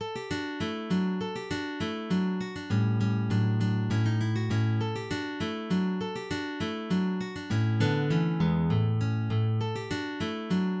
allegro grazioso, guitar; Ferdinando Carulli

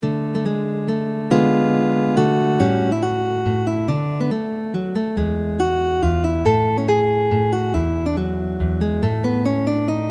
Mazurka, guitar; Johann Kaspar Mertz

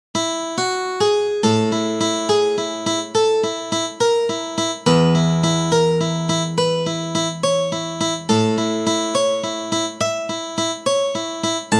Exercise for slurs, guitar

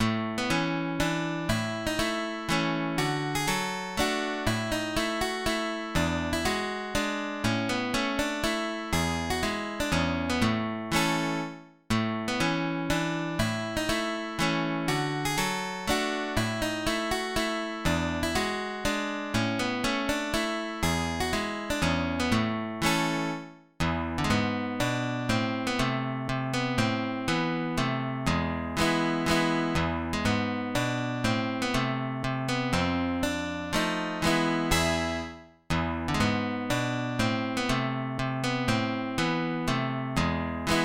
Spanish Mazurka

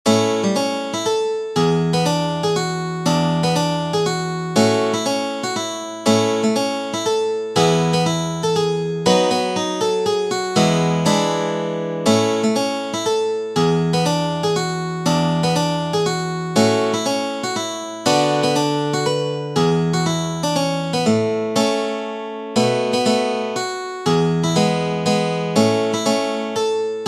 MAZURKA; ESTELLA MAZURKA

